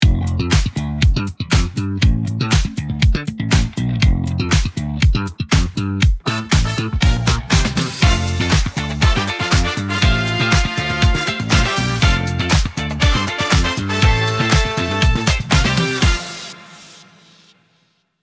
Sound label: music